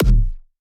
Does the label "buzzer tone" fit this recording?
No